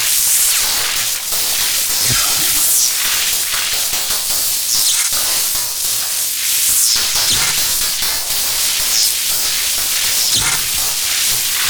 Forest Lightning Puzzle Loop; Veilbreaker; Grineer Forest Thumper; Kahl

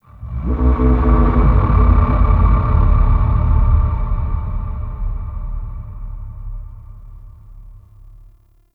Glass Texture Large, Ambience; Warframe; Cephalon Weave

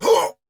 Pain; Warframe; Dax Ashigaru; Battle Barks

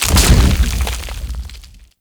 Condemn Earth Crack; Warframe; Priest

